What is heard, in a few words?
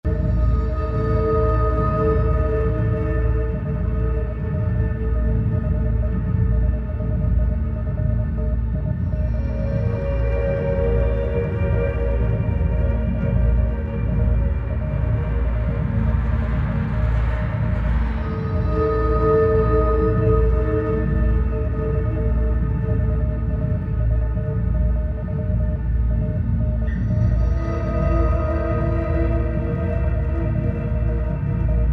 BGM Lobby; starbites